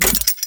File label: Scythe Mech; Weapons; Melee; Warframe; Archwing; Exalted Archwing Scythe